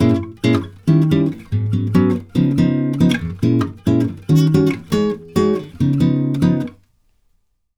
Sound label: CHORDS, Brazil Bossa; USB Soundscan vol.16